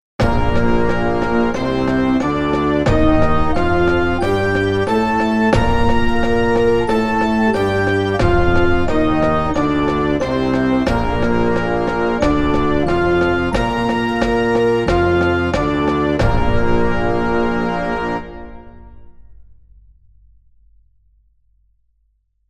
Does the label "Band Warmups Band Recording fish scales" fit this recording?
Yes